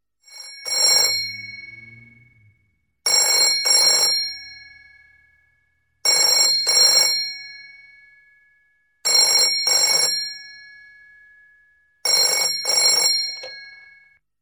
old phone

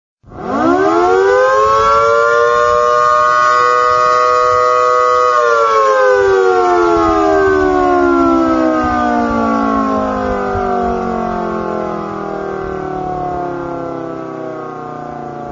alert tone

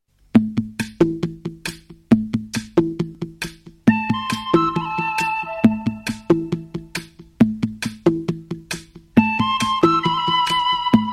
flute tone

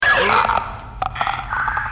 clicker

